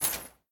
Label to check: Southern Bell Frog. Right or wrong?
Wrong